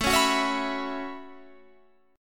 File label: A 6th Add 9th A down Guitar Standard; A6add9; strummed